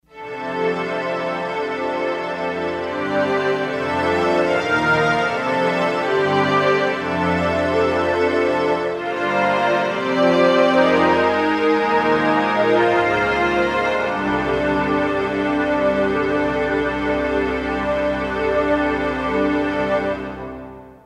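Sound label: String Chorus Max Reverb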